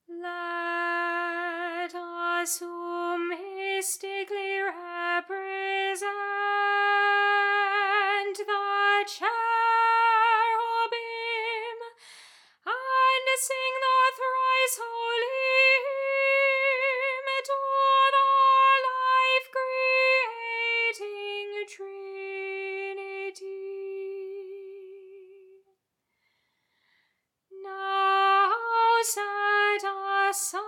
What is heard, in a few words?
Cherubic Hymn Soprano